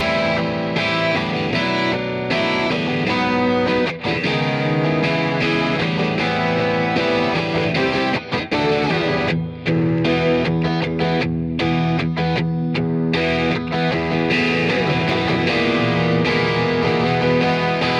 guitars after

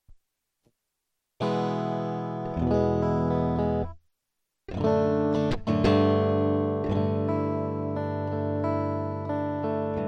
basic

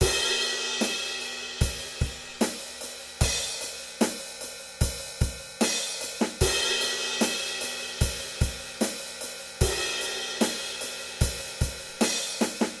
drum

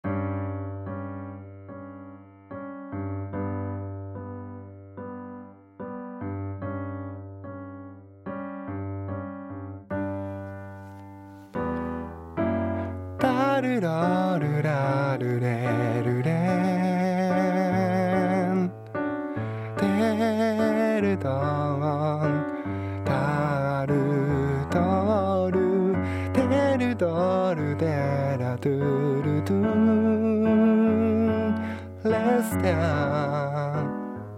new key

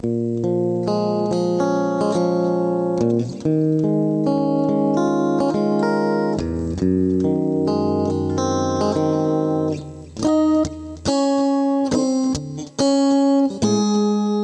guitar mix

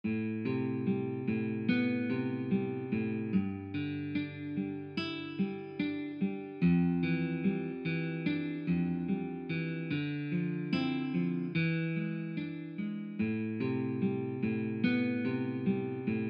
ballade

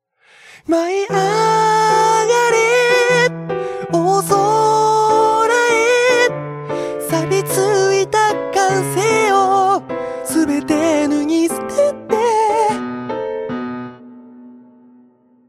high open

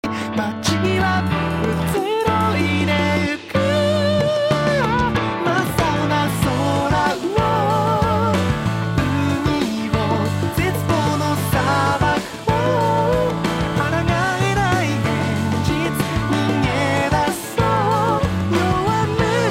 clean neutral

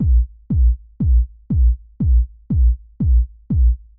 kick before

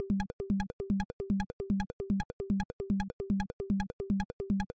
electric blipz

